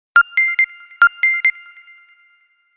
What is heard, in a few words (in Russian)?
standartnoe sms na nokia x7